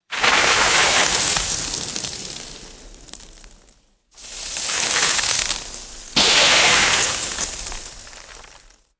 small icy snow debris scattering

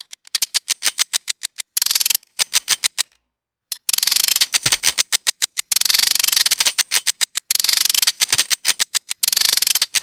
rapid metallic ratcheting ui clicks

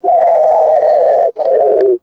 resonant synthesized space whale vocalization; creatures

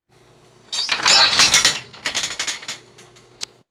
metallic starting gate springing open; ambience

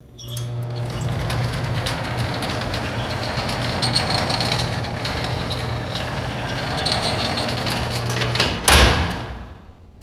metallic roller door closing and latching; doors

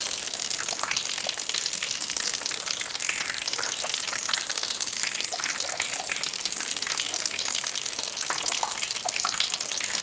rapid water dripping and trickling

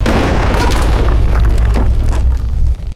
medium explosion with splintering wood debris; explosions

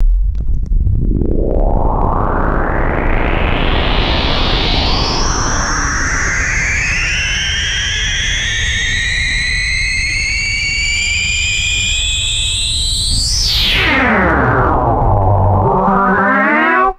eerie analog synth filter sweep riser; designed

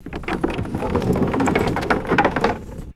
small wooden cart rolling and rattling; vehicles

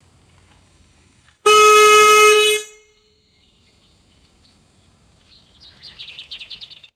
single and double vehicle horn honks

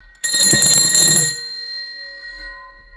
bright metallic service bell ding; food-drink

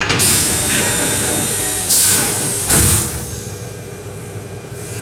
pneumatic press cycles with air hiss and clank; tools

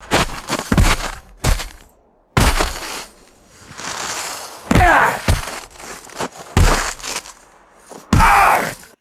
heavy body falls on crispy snow

